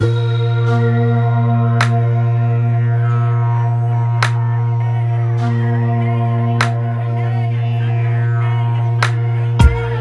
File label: Ethnostep; SUBBASS NETLABEL